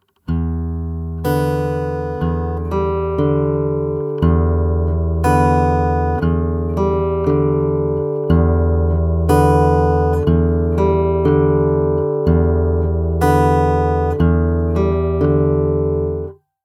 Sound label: Fingerstyle, acoustic guitar; alternating bass fingerstyle pattern; Alternating Bass Fingerstyle 1